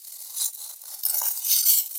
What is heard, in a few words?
noise reduction, recordings under ice